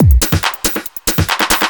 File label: hard beats 140, hard trance beats